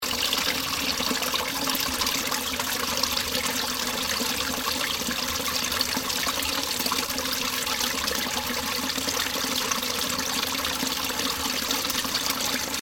排水路の流水音; haisuiro

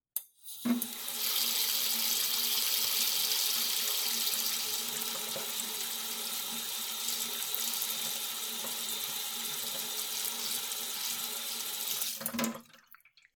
蛇口から水が出る音

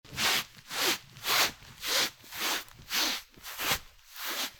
畳の上をすり足で歩く音; walk

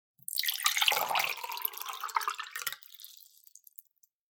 グラスに水を注ぐ音

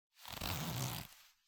ジーパンのジップを上げる音

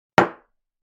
グラスを置く音

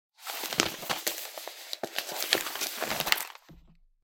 新聞紙を広げる音

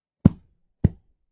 布をポンポン叩く音

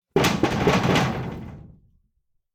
和室の扉をノックする音; door

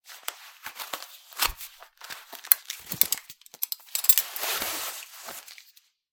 ベルトを外す音

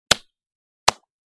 コンクリートの壁を素手で叩く音; concrete

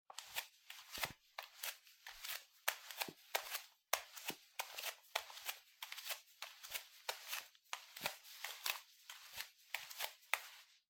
フローリングを裸足で歩く

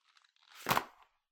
ゴム手袋を置く音

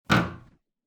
フローリングがきしむ音

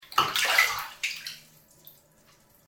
お風呂で一緒に入ってる人の腕をゆっくり離す音

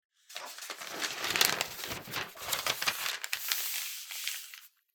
新聞紙めくる音

